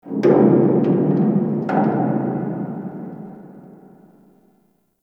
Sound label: Corpus Infested Distant Metal Creak; Warframe; Ambience